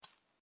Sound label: Knife Slight Nick